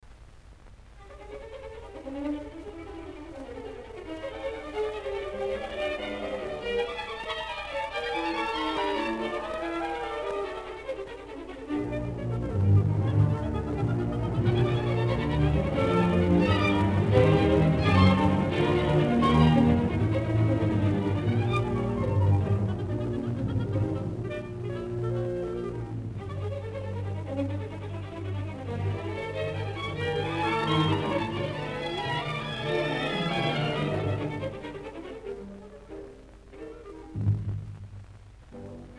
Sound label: Elgar Filtered